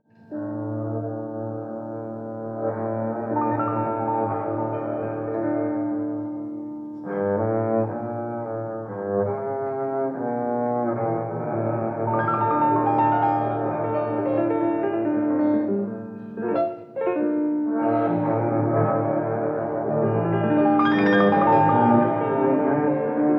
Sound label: Piano Bass Duet; berliner jazztage nov 2nd 1972